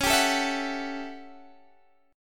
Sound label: A 13th Db, guitar chord